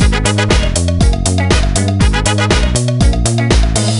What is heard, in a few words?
Disco Flourish; Happy Feet Two; NDS